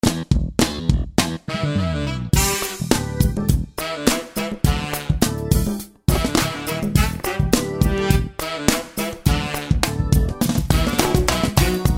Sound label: E Bass Picked; Irresistible Latina